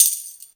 TAMBOUR K, Ragga & Dub Reggae

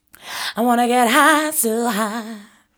VOC, Disco Fever VOCALS; Best Service ProSamples vol.48 - Disco Fever; AKAI

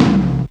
Big Tom, Fairlight CMI IIe, Drums

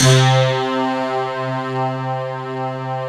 C3, Synth; UTOPIE

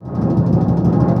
BIG TIN, rhythm loops; Rarefaction - Poke in The Ear With A Sharp Stick

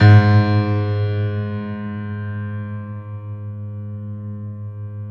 PED FF, GRAND PED; Best Service Advanced Media Trax Modern Composer

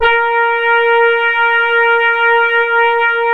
A#, Synclav FM, BRASS; Big Fish Audio

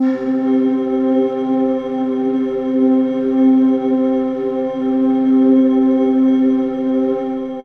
Pad; Dream Zone

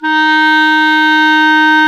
CL D#3, Clarinet; Sound & Vision - Gigapack I CD 2 (Roland); Brass&Woods1

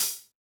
HH, Yamaha DJX 2B

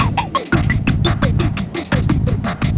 (arp) serge; Sonic Foundry (Sony Creative Software); Ugly Remnants VOL-2

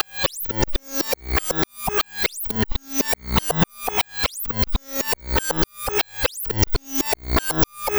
Synth Arpeggio, Synths; Eclectic Electronica

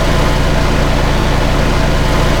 Engine Constants, Transportation; E-MU Producer Series Vol. 3 – Hollywood Sound Effects

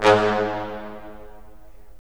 BRS, Roland LA Composer

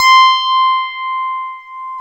PNO XFM, FM Rhodes; Club-50 - Foundations Roland

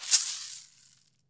caxixi; AKAI S-Series CD-ROM Sound Library VOL-5

